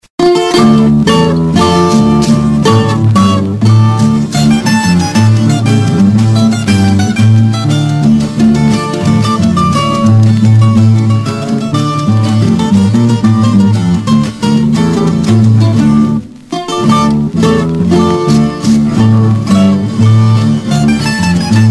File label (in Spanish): Carameleo (Bambuco instrumental)